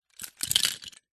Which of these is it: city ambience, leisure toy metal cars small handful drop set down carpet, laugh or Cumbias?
leisure toy metal cars small handful drop set down carpet